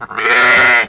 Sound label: SHEEP; RallyC; AMBIENT